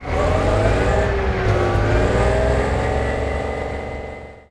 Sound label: chieftain engine int load, engines tanks; War Thunder